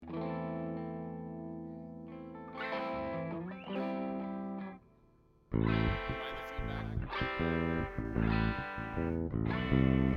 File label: Strings Mix